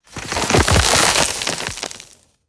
small rocks and gritty dirt sliding

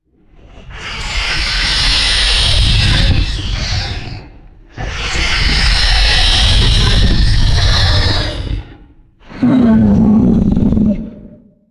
airy hissing creature vocalizations

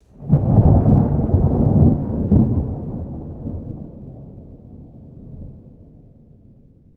deep soft distant thunder rumble

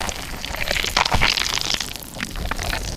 thick wet mud suction squelch; liquid mud

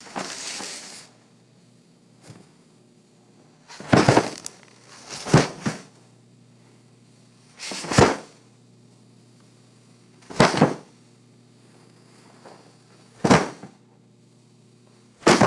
thick rubber sheet rustled and dropped

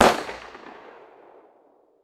single sharp rifle gunshot outdoors

